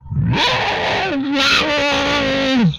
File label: death, vocal; MMRetroArcadeSoundsPack